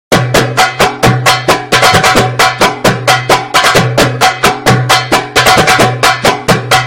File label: Tablah